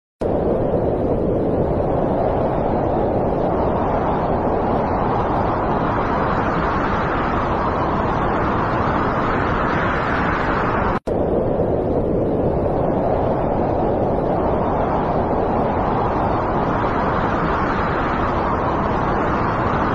Martian wind

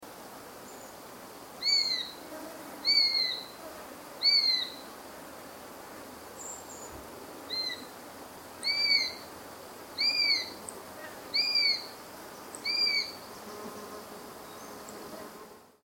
eurasian sparrowhawk sound

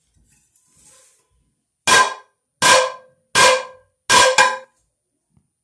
frying pan; ضربه زدن با ماهیتابه

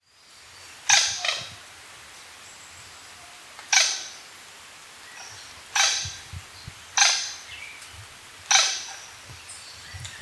Blue coua; پرنده کوآی آبی

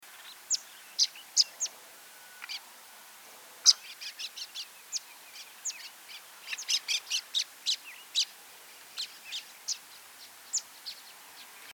Scaly feathered weaver; صدای پرنده جولای پر پولکی

